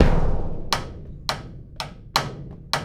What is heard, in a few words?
Big Drum and Sidestick; Sonic Foundry (Sony Creative Software); Essential Percussion; Drums; Big Drum Combos